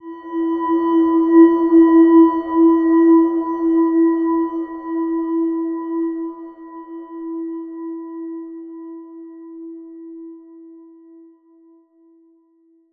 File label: Silver Gem E4, healing soundscapes